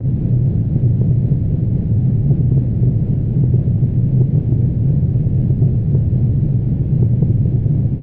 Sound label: flame